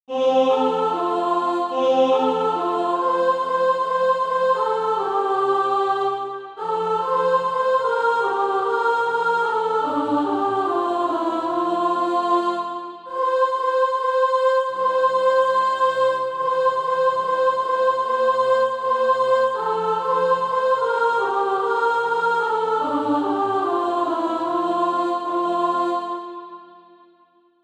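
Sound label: soprano; Faith and Works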